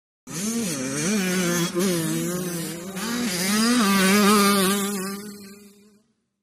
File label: Motorcycle Motocross; By 80 Cc ( Several ), Cu Fast Bys R / L, Shot Cu.